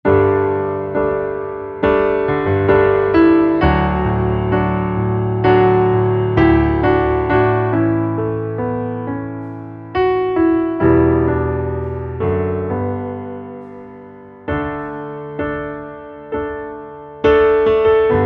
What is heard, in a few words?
Underscore PIANO; Worship Flow